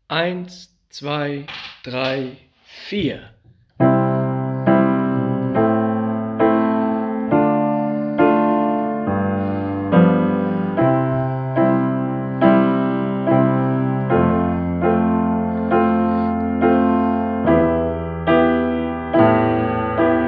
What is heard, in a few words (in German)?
Einspieluebung Glockenspiel